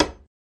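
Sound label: Chisel Hammer Single Hit; chisels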